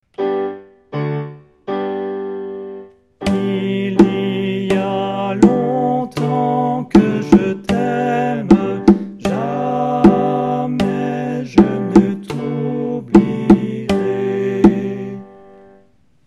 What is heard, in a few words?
Tutti